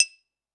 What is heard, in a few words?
glas